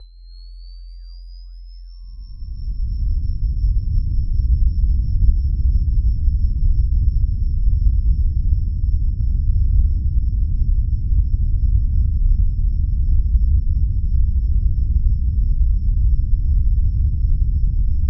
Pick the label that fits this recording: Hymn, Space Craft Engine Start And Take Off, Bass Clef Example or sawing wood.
Space Craft Engine Start And Take Off